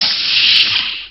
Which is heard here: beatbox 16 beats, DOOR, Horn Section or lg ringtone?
DOOR